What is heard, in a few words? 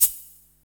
SHAK 2, Hip Hop R n B; USB Soundscan vol.19; AKAI